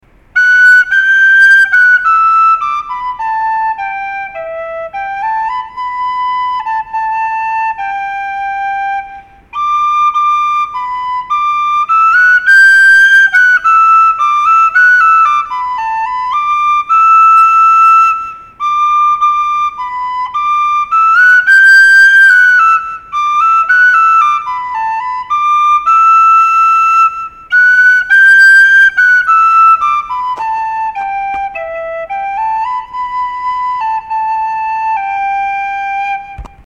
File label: feadog